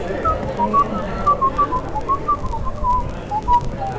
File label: test whistle pachelbel